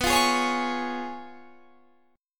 Db Major B; Db/B; strummed

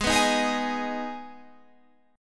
Gsharp Minor; x,x,18,16,15,15,17,17

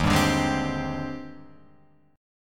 Eb Major 13th Eb Guitar Open F; EbM13; strummed; JGuitar

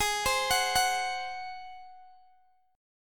Ab 7th Ab down Guitar Open G; arpeggiated

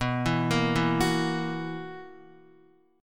B Major 7th Suspended 4th B down Guitar; BM7sus4; arpeggiated

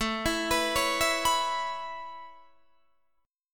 A Major Add 9th A Guitar Open D, chord

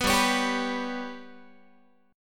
G Major Flat 5th Guitar, chord; GMb5; strummed; 14,15,16,17,15,x

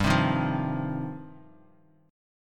G 7th Suspended 4th Sharp 5th, Bass Standard; G7sus4#5 strummed

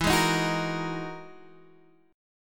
E-Major Sharp 11th E, Guitar Half Step Down

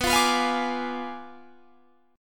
B Diminished 7th B down Guitar Standard; strummed; JGuitar

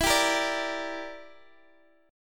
E Minor 7th Flat 5th E, Guitar Open G

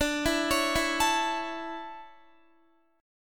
D Major 7th Suspended 2nd, Guitar

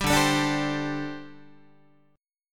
D 7th Suspended 4th, down, Guitar

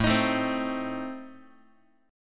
A Diminished, down Guitar; Adim strummed; x,5,6,7,4,x; JGuitar

